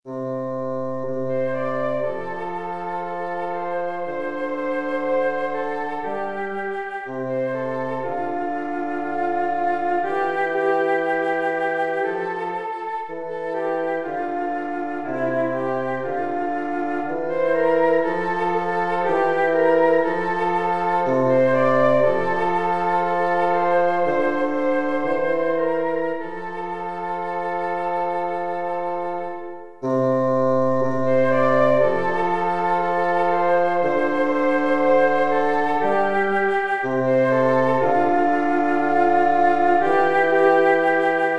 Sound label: woodwind hoerbeispiel